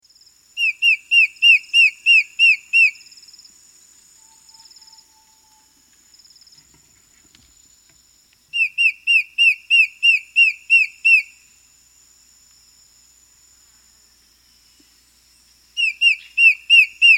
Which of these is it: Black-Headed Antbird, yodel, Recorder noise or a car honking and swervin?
Black-Headed Antbird